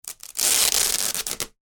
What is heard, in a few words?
office tape parcel rip, Parcel Tape Rip From Roll; Parcel Tape Ripping From Roll; Offices; McKinney Sound